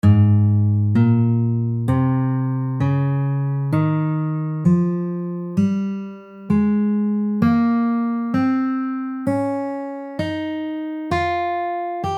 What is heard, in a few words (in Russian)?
гамма ля бемоль мажор